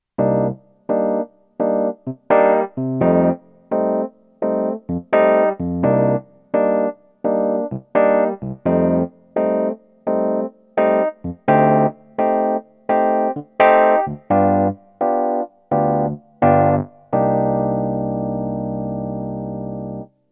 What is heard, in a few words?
Roland RD-88 Tine E-Piano Mk1